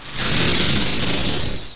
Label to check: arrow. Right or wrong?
Right